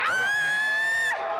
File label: walking screamix